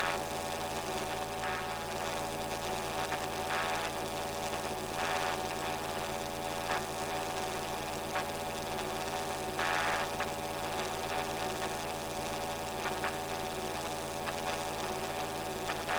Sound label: Hum Loop, Sci-Fi Sounds